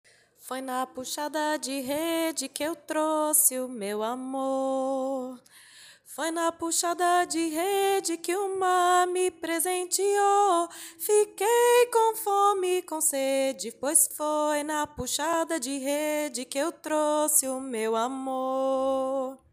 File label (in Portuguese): teste aptidao puxada de rede voz aguda